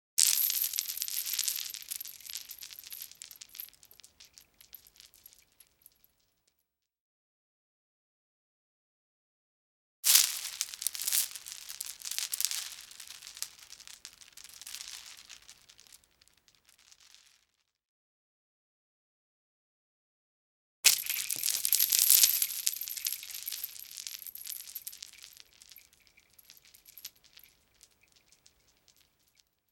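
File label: beads scattering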